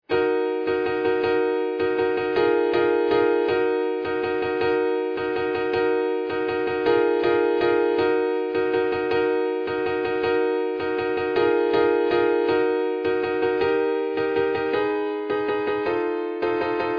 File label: Piano